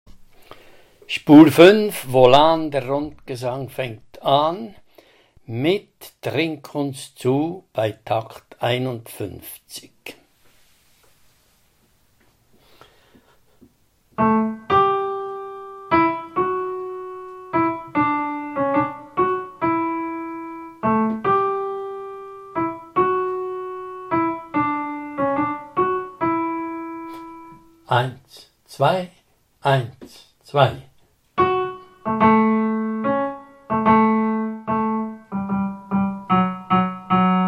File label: Tenor; Wien